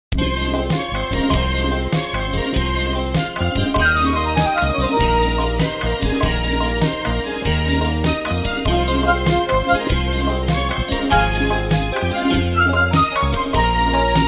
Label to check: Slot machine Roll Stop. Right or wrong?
Wrong